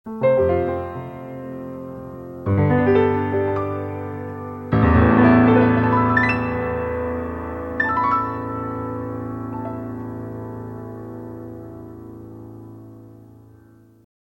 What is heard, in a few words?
Piano Stings